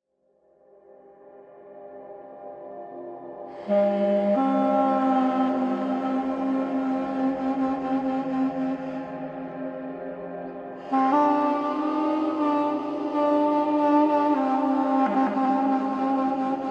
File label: Duduk